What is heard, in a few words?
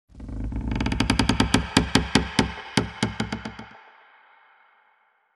bridge creak; gnarled forest